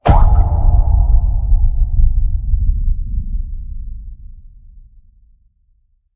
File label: Water Sewer Hit; Red Dawn